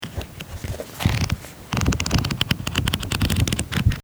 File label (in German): office buch